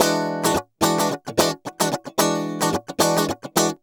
110 BPM, Roland; Sound & Vision - Gigapack II CD 1

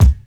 RB 100 BD 2 -L, Hip Hop R_n B; USB Soundscan vol.19; AKAI

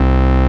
BASS Schwang, bass; M8; Electronisounds

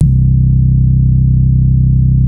Anasound Long C, Industrial Analog Single Notes; Sonic Foundry (Sony Creative Software)

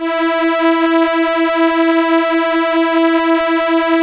STR ELKA Cellos, Elka Strings; Roland LCDP09 Keys of the 60s and 70s 1

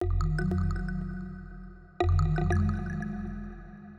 Synth Block Loop (120BPM); cinematic drama; Loops; musicradar

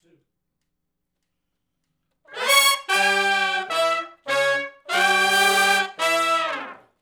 R&B Riff (E) unison, Horncraft; Sony Creative Software; Crimson Blue and Fabulous Horncraft 4 RnB; Horncraft for R&B; Sections

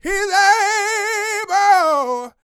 Vocal Gospel; Vocal Planet Vol1 Gospel

